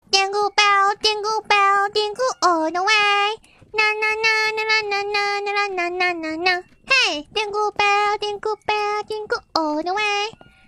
jingle bell; korone; vtuber

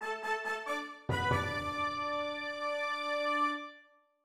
victory

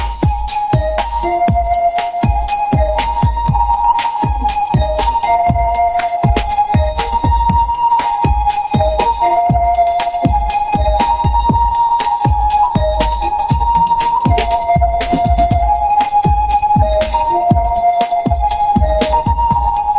background music; girl dancing

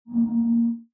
close

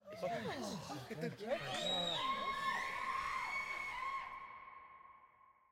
crowd Reaction jungle big Reveal; spellbound

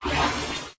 bonus; endless truck

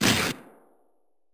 damage, building

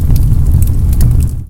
flame

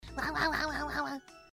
puppy noise; korone; vtuber

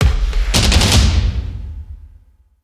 sound Before Dealing Cards

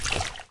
splash

